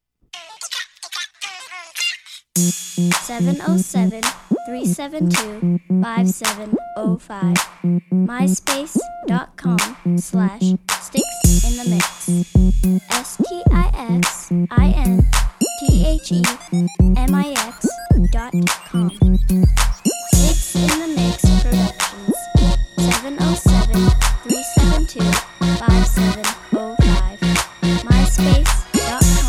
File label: boom dee clap, beats